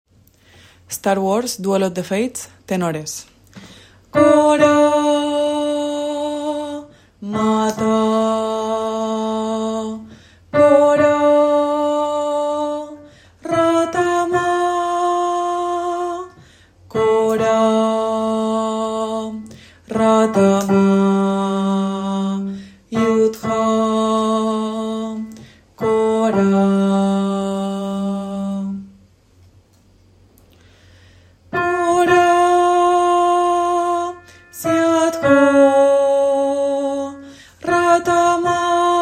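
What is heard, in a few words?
duel tenor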